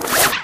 flechette, ministrider

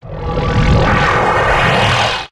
teleport send; rescue ranger; tf

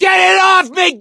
scream while pounced; source engine; left 4 dead 2; survivor; gambler

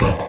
menu invalid; Left 4 Dead; Source Engine

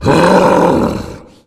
tank dormant, voice breathe; Left 4 Dead; Source Engine

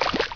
flesh bloody impact hard; Source Engine; video game

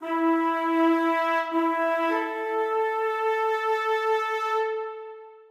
taps; Source Engine; tf

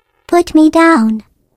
turret pickup

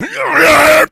rage, infected, male

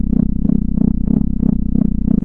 combat scan loop, scanner; Source Engine; video game; npc

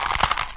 bow shoot pull reverse; tf

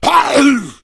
hunter pounce cancel, voice; player; source engine; left 4 dead 2

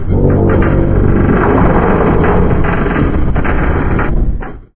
floodgate move short; ambient; Source Engine; video game

